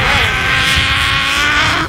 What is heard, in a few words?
condor speak; svencoop; morass beta